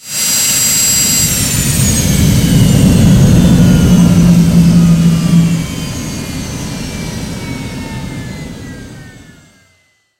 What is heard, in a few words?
jet engine stop cockpit; War Thunder